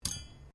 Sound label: cutlery general place cloth, Household cutlery